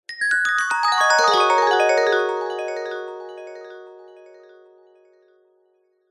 fantasy magic glissando bright mallet style magical burst descending